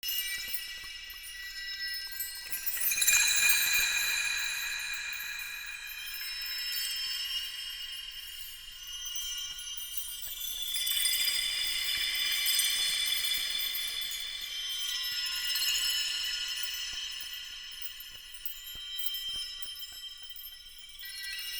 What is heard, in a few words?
Tree Puzzle Location Hint Loop; Midrath; Wevetwood; Bromius Quest